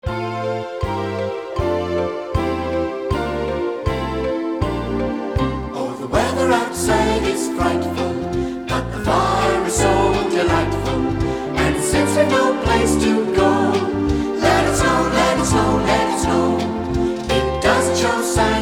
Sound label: Christmas Music Christmas Songs Jingle Bells